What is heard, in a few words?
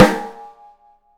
OPEN BRASS, 3D Drums; 3D Sound